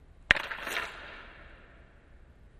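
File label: Hockey Medium Impact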